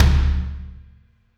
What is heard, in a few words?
Kick Hard, Drums